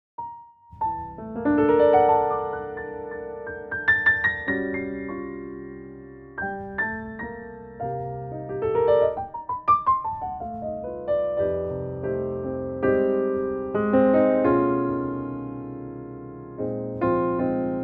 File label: jazz